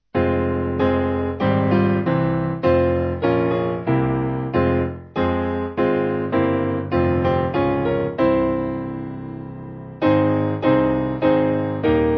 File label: Piano